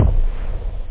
bang; OctaMED SoundStudio V1; drums techno

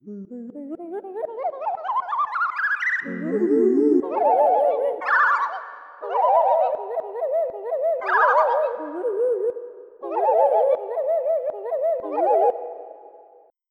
LOON; croc; AMBI BIRD

